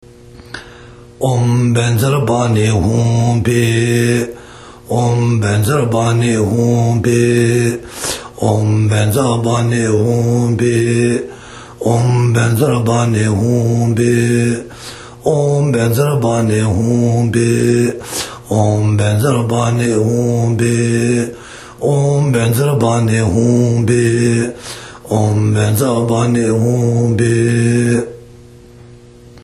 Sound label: Vajrapani Mantra; KinhMatGiao